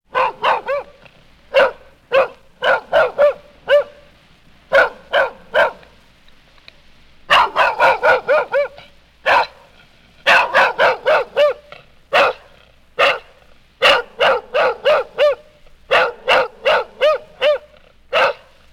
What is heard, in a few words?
Dog Barking Full Grown